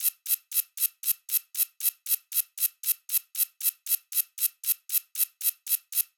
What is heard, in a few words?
Notification alarm subtle signal periodic loop, UI SOUNDS FUTURISTIC; Sound Ex Machina